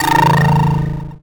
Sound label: sound design warm modulation; zapsplat